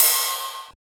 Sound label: Ride; FLPRO